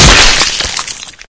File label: body splat